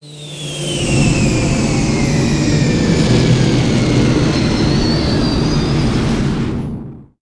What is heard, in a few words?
fly; steel